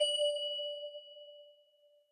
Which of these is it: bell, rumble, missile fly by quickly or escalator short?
bell